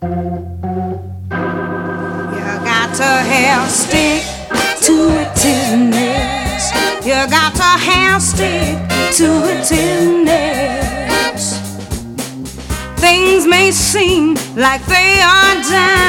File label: with harmony vocals